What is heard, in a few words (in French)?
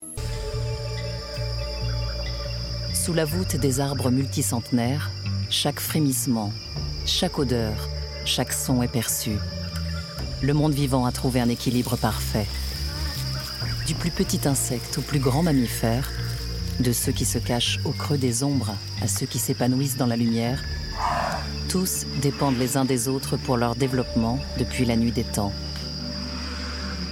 forets congo; Arte : A la reconquête des forêts / Congo